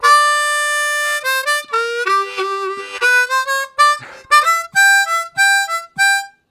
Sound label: Urgent, Harmonica; Zero G